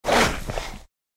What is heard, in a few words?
Worm Dodge